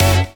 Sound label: Synth Shot; Vengeance Samples